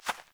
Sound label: Hare Step; Encounters; Animal Rescue